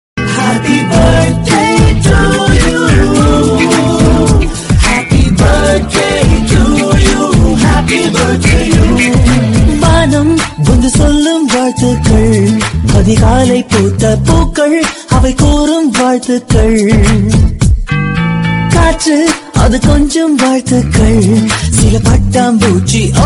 Tamil Birthday Song

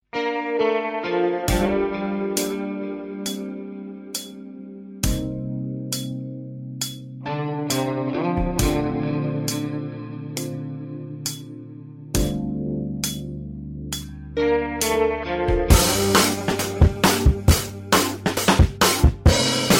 oy beat ringtone

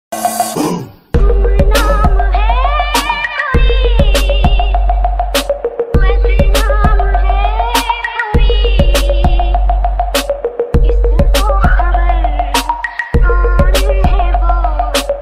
Remix Ringtone BGMs; BOOK

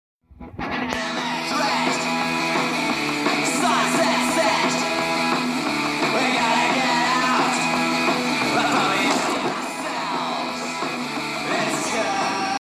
sunset city music

